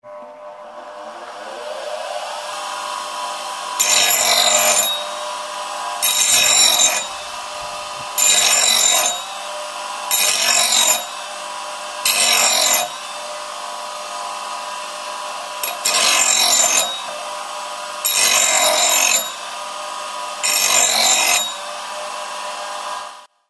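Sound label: sharpening an axe on a machine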